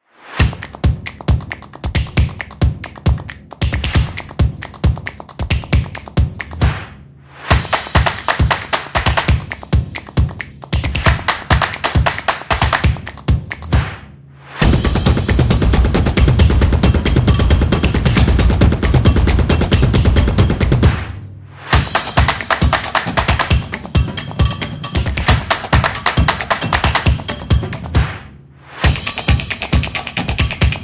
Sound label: Fast Action Claps; casual game